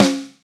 Good Acoustic Snare Sample C# Key, Royality free steel snare drum tuned to the C# note